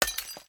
Glass; Blockman Go; Android

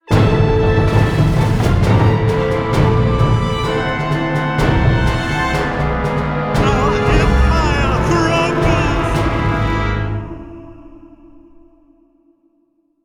Menu Lose; Agatha; chivalry medieval warfare 2012 pc

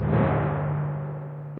Tymp

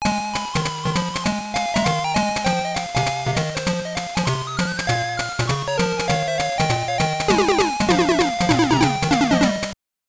Level Complete; Cool Spot; Game Boy

